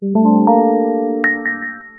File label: soft harp tone transition